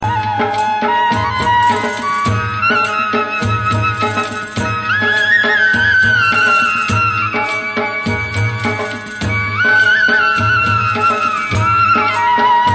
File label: Flute Tone